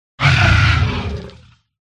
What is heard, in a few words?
sea monster attack middle